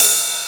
ride, Drum & Bass; Ueberschall; Drum & Bass Resonance

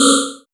Buchla Modular percussion; Buchla Modular System 200; Drums

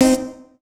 chords

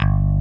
PICK BS, JV 1080; Pro-Rec - Synthsonic

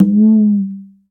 conga batter; Best Service ProSamples vol.55 - Retro Sampler; AKAI

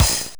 Crash Cymbal; Minnesota Fats; M8; Genesis

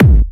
Trance Kicks

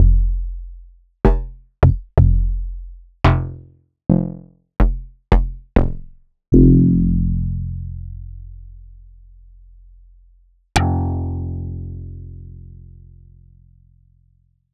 Yamaha TX7 TX81Z Bass

